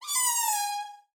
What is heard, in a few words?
hedgehog scream; battle